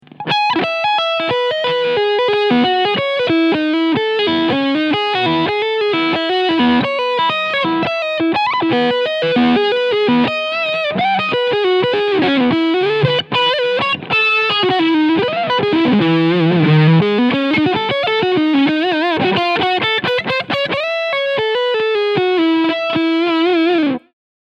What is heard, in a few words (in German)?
Ibanez RGT1221PB DTF Lead Sound beide HB SC Modus